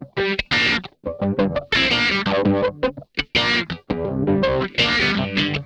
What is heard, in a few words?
BREAKIN UP, Funk Guitar; 90 s Sample CDs; Zero G